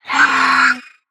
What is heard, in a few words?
raptor short roar; soundscrate